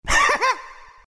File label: laugh, zh; dota2